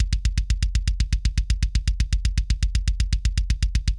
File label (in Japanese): ダブル奏法 ドラムセット